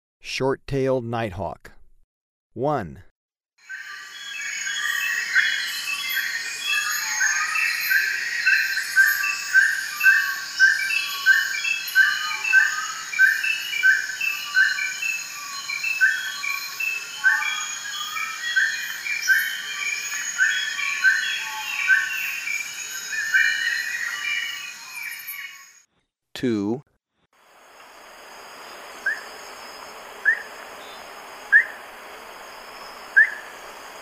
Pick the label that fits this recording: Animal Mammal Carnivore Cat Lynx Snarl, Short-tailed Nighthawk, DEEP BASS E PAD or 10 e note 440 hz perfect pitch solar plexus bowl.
Short-tailed Nighthawk